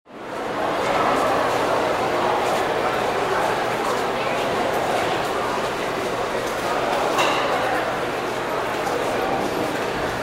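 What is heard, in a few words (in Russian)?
zvuk tolpy nochju, crowd at night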